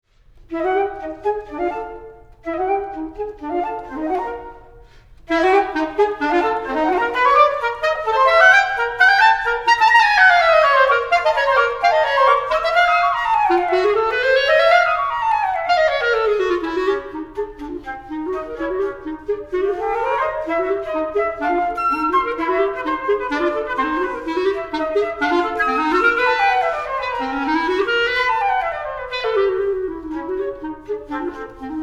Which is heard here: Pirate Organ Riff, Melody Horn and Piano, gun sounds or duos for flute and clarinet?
duos for flute and clarinet